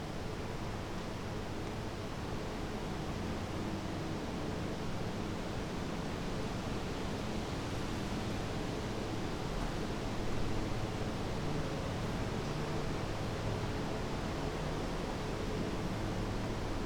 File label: factory ambience; AT4021; ORTF; DR100mkIII